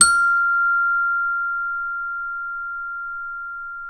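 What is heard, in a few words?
CELESTA G2, Synclavier Percussion; ILIO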